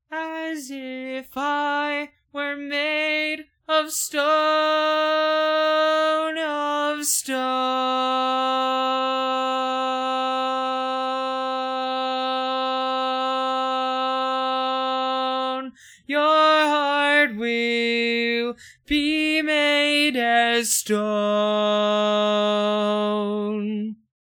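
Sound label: Baritone, barbershop tags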